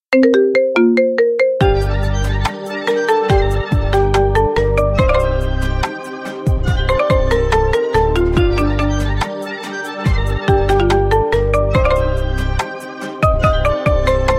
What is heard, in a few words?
marimba marimba remix